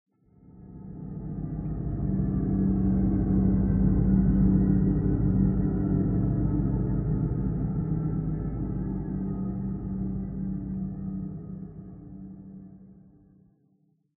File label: Library Main Pad; The Sinking City